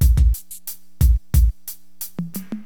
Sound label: Drums loop, HipHop; LiveAct Pool 1